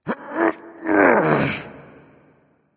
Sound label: grunt lift; smite; osiris